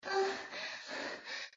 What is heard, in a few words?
Moan; Lewd SFX